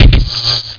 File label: Bolt; BOOM